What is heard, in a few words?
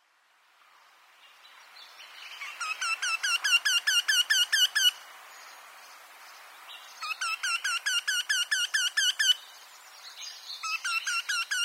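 Eurasian Wryneck; arisui; monbetsu; koe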